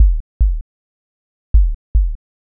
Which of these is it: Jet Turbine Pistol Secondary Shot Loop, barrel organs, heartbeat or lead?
heartbeat